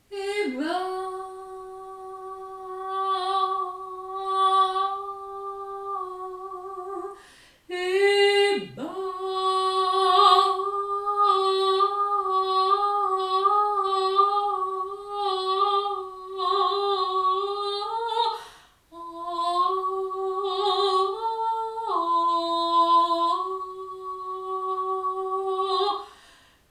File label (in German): Hathorengesang